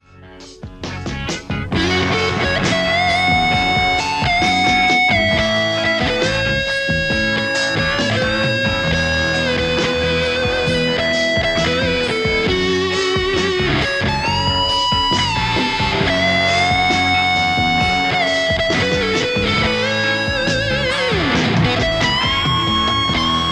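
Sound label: Telecaster; igniteamps theanvil v2 01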